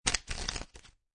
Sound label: Cards Playing Cards Flipping Through; Playing cards, poker. Flipping through cards.